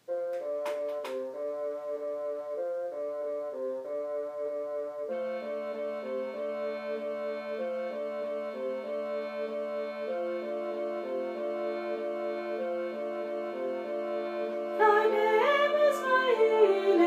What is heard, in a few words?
thy name is my healing, soprano voice